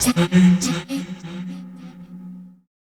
VOICE FX, Dance Vocals

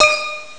disc pling dur; ST-XX Amiga Soundtracker Sample Packs

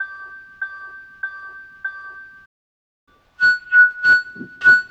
Flute; Sonic Foundry (Sony Creative Software) - Stylus Pressure Urban Grooves on Digital Wax

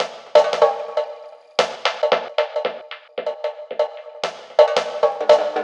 Mixed Percussion, percussion loops

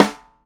Hyper Snare B; Airwindows

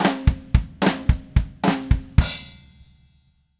Red Maple Groove 110, DW Red Maple Kit

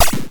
Electron Sidstation, Drum Machines

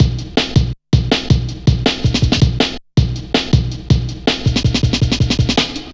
Drum Loops, Jungle

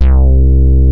SYN-BASS, Akai MPC2500

